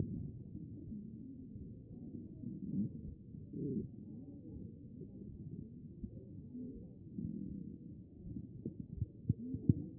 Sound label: CHEST pos4 B MODE